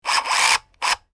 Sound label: RC truck steering servo movement; rc toys